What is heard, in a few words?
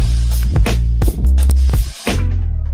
hub intro sound